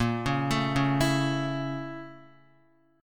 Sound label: Bb Minor Major 7th Flat 5th, Guitar; x,1,1,2,1,0; BbmM7b5 arpeggiated; JGuitar